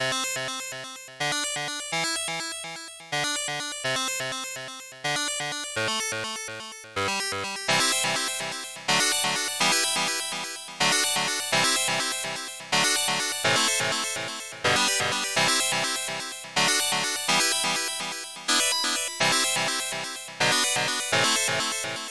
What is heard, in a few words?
lunar, chiptune